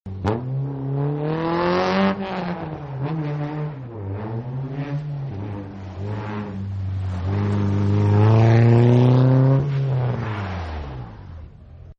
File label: Rally Sport Drives and Skids, car; Rally Sport Drives And Skids 2; Cars; Vehicles; Partners In Rhyme